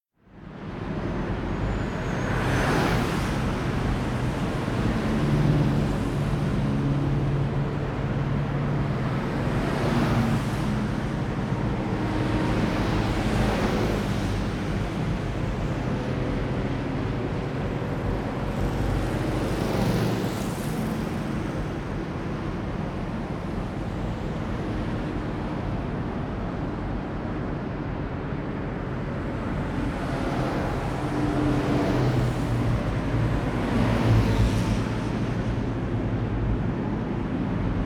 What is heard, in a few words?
Next to the highway; KMP Film Video Sound Effects